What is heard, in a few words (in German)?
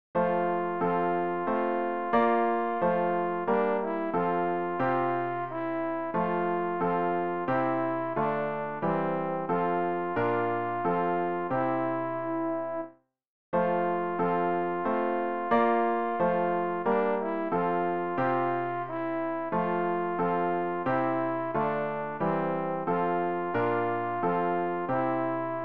alt, Übehilfen für das Erlernen von Liedern; ref gesangbuch; rg 177 ich bin getauft auf deinen namen